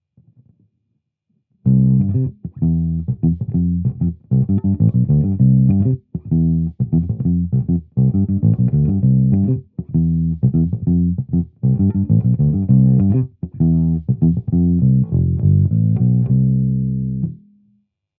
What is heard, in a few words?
markbass cmd121h, bass